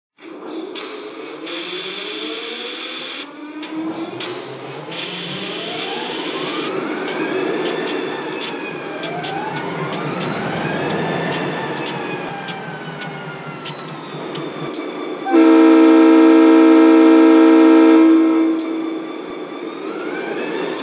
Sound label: DDA40X, trains